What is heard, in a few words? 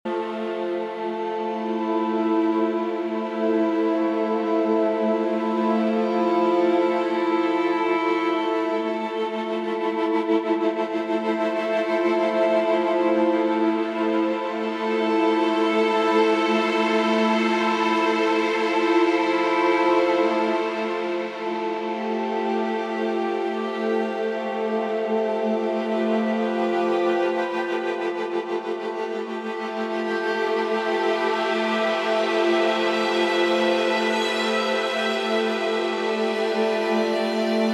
Storm Drone; Orchestra